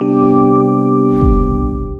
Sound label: Power Off; SK Telecom